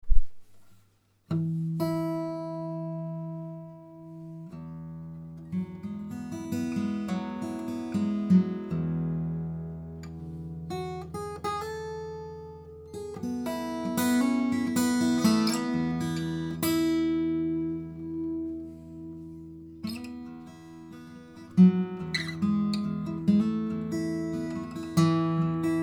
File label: fingerpick